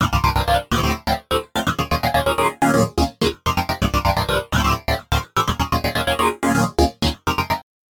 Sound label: … and finally the new gated string in isolation.; Logic